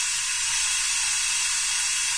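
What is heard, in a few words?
ambience pipe air; dm subchamber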